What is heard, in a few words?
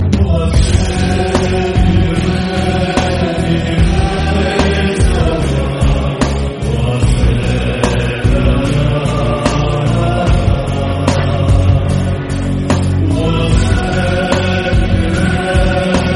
Humming Ringtone